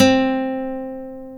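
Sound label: NYLON B 3, guitar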